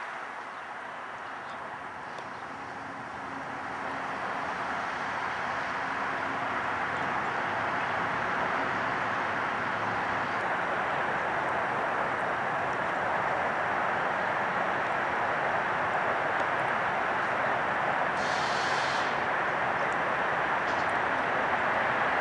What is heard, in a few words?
Cooper's Hawk